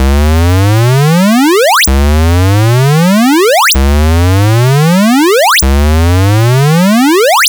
Game Boy FX Alarm Loop, chiptune; Nintendo Game Boy

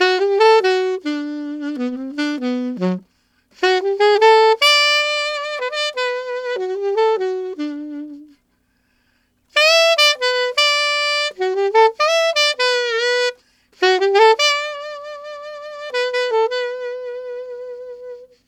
Alto Swing G maj, Sax; Sonic Foundry (Sony Creative Software)

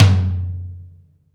Room Tom Mid, Room Drums; Akai MPC500

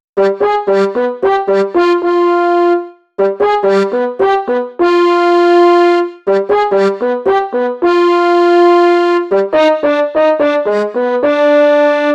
Melody Combo; Cinematic Funk; Sonic Foundry (Sony Creative Software); Cinematic Funk Urban Tone Poems

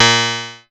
A#, PIANO; Big Fish Audio; Synclav FM

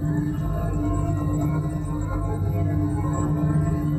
Tonal Re Draw, sparse soundscape

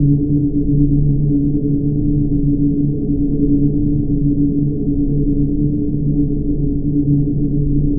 Drone Ambient; Drums & Drones

